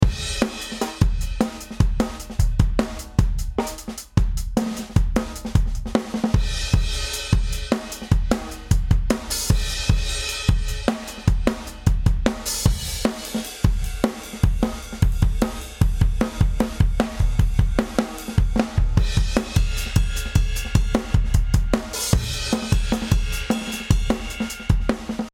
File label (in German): Schlagzeug mit VC160 Ratio 10 1 10 dB Gain Reduction